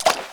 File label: Splash Fire Ball